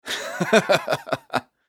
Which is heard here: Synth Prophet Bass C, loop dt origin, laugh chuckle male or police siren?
laugh chuckle male